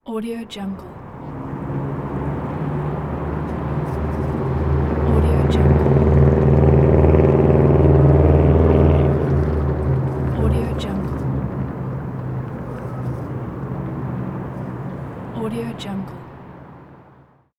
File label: car passing by city